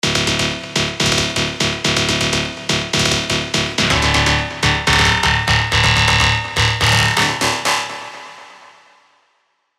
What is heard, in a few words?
Stab, melodic leads; Stab Magnetica; Revealed Serum 2 Melodic Leads Vol. 1